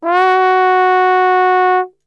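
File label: F#3 trombone glissando, Brass Super Section; Best Service; TRMB GLISS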